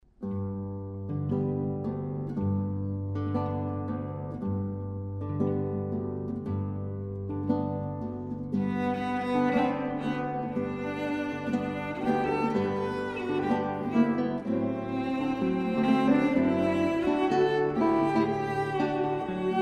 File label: Tango